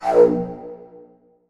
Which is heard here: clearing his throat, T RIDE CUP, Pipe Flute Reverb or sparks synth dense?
Pipe Flute Reverb